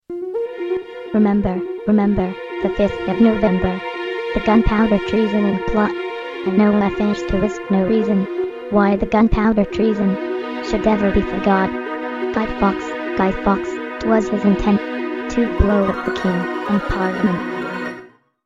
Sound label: Child Rhy